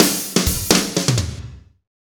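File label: slow shuffle, Roland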